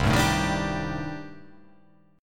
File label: C Minor 6th Add 9th C